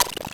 Bent Cymbal; glitch; Bent Hits

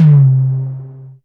FAT OM HI, TOMS; Back In Time Records; Big Bang

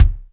KICKS

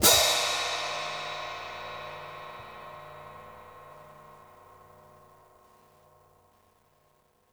hihat - open; JazzFunkKit

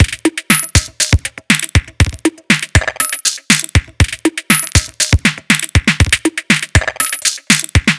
Funk Beat, Drum Loop; Krator Funk Beat; Sonic Foundry (Sony Creative Software); The Electronic Music Manuscript Collection; Drum Loop Structures

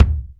DM5 Kick, Alesis DM5 drum machine

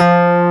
D-PF-F1, STACK PIANO; AKAI S6000 CD-ROM - Volume 1

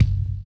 HI RIDER; Spectrasonics; Metamorphosis

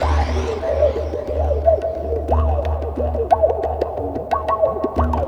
Ambi Groove

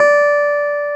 CLAV D5, CLAV PIANET; Sonic Reality; Vintage Timetraveler

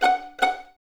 Violins F#5 Pizz, String Ensembles; Miroslav Vitous; 11 Violins

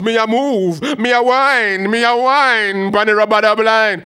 HAL RAGGAM, RAGGA-MUFF A maj; Sound & Vision - Gigapack II

